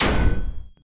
machine hit

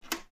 key in

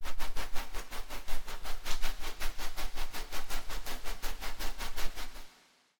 bath wash

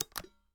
rice cooker open

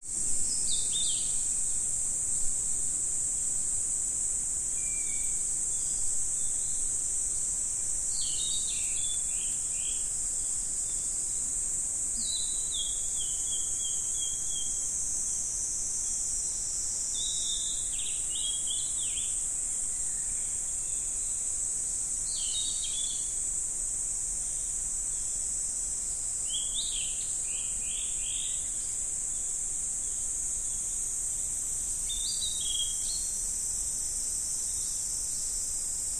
summer mountain

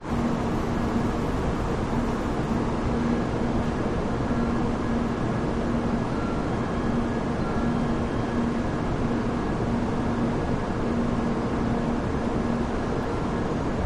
bullet train home

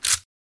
handgun ready

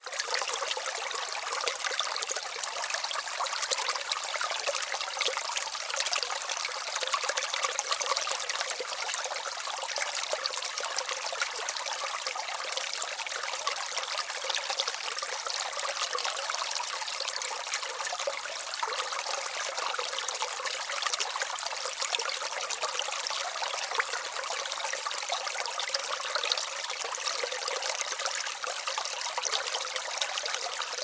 creek

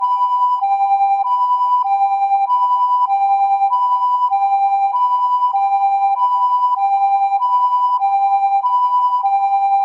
ambulance siren

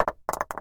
stone rolls

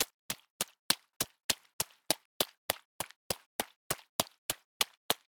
dash wet

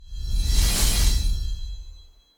metal logo

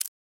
cursor, button

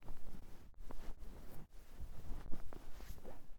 futon mozomozo

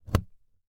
socket insert

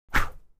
sword gesture

